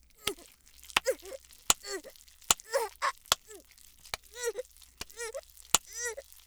痛い方が強い

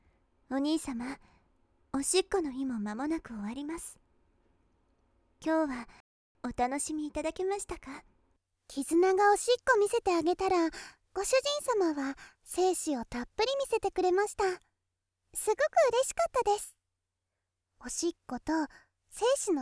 3人同時おしっこ

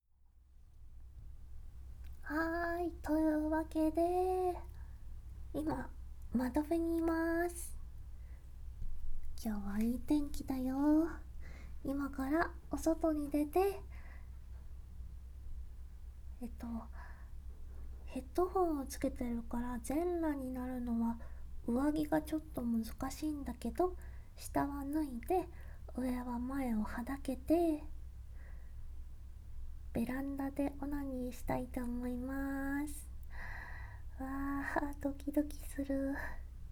洗面器おしっこ; ベランダで究極羞恥オナニー

